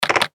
MECHANICAL GEAR SHIFT, Button, Mechanical, Hard, Hit; Production Element, Imaging Element, Accent, Transition; Multimedia; Blastwave